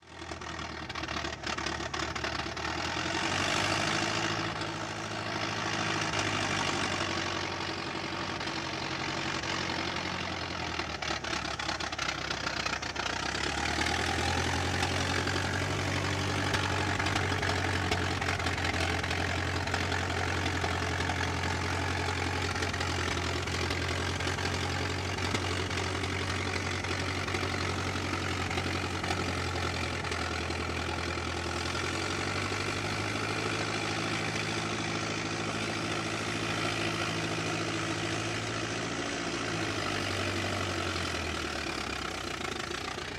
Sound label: Ford Model T Away By Out, Period Vehicles